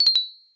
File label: ding down